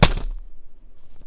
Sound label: snare ish lighter distortion, Cigarette Lighter; UNUSUAL KITS and FX